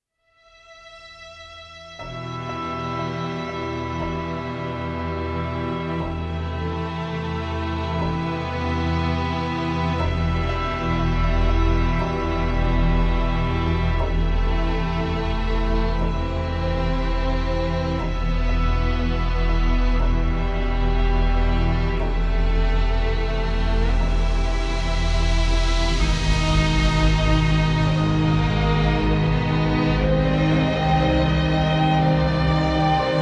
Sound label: Worry Ambient